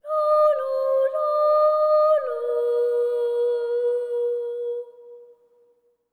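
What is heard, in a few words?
BOY phrase, Symphony of Voices; Spectrasonics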